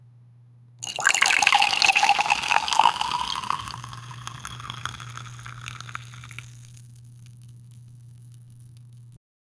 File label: milk pouring from a milk